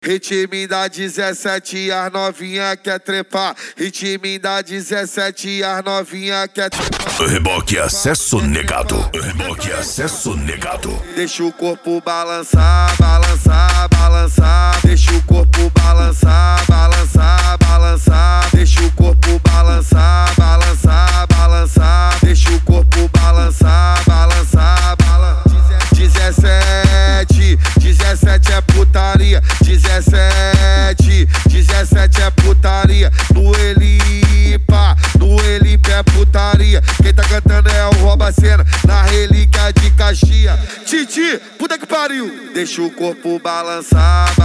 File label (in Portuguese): racha eletronica e funk mega bass